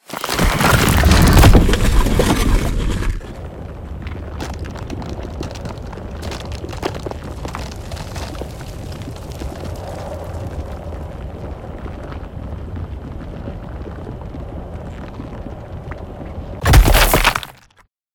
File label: Glider; Aztec